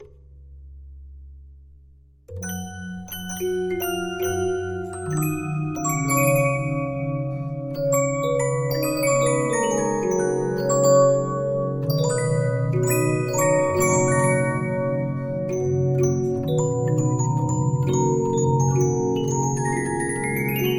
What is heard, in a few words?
Regina, 15inch disc musicbox